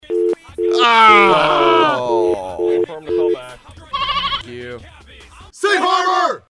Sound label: awww